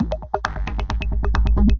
133 bpm, big clav subba beat, drum loops and breaks